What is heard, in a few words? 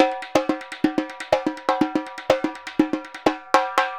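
repinique, DRUMS SAMBA; Best Service ProSamples vol.14; AKAI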